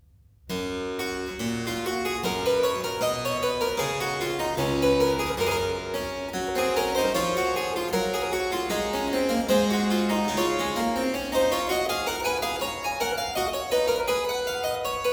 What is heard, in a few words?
Dreistimmige Inventionen in G major, Keyboard Works; J.S. Bach; BWV 796; Johann Sebastian Bach; Inventionen & Sinfonias